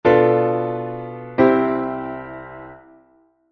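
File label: half dim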